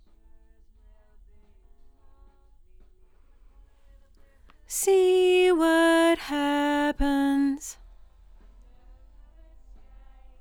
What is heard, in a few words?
LdVOX Double